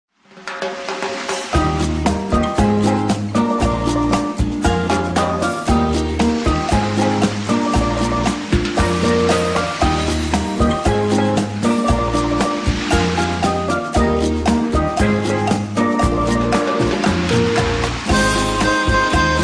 caribbean music bahamas beach songs instrumental tiki bar island music; ocean luau party